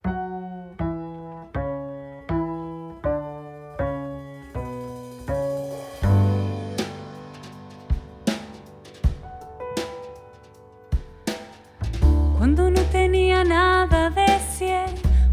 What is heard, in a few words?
Cafe Latino